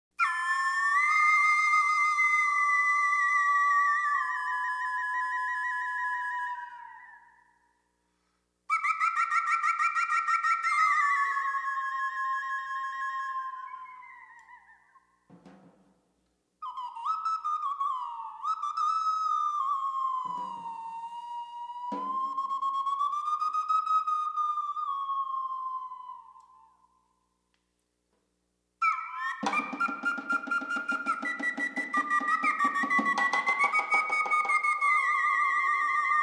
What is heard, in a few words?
Candlestick Whistle; Fredy Studer